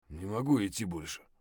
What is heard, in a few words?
bear low hp; eft